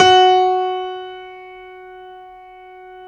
Stereo Grand, Keyboards

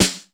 ROOM S, ROOM KITS; Crypton - DrumBase5000

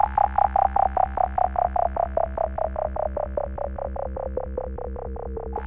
RES FILTER, RHYTHM; AMG - Vince Clarke Lucky Bastard

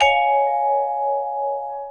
VIBES; Houseworx

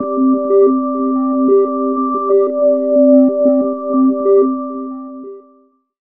odd C, Weird Loops, Chillout; Best Service ProSamples vol.36

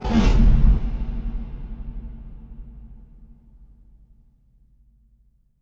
impact flame, Cinematic Impact

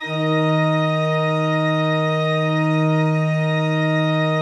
D2, Cathedral Organ; ILIO; Propeller Islands Cathedral Organ

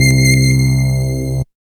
Screecher, Pads; Jungle; Zero-G Jungle Warfare 1, 2 & 3; 90 s Sample CDs

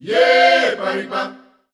Ye Paripo F 120, Voices Of Africa Sung Phrases

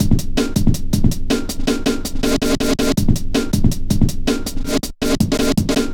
metallic stepz, drum loops; Zero-G Jungle Warfare 1, 2 & 3

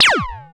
FX A, ANALOG; Sound & Vision - Gigapack II

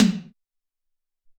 SR16 Tom, Alesis SR-18

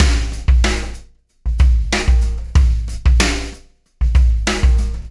Beat, Processed Drumkits; Event Horizon

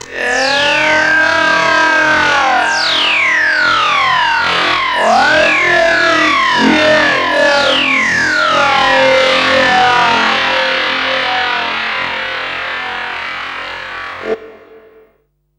FX, Drum & Bass

